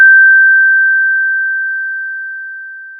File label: pop, Sound of a school bell